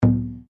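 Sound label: Drum Taiko piano, Japanese Taiko Drum; Miscellaneous Musical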